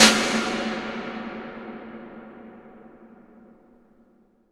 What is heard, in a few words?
IMP SNR DRUM, Drum, Cinematic Impact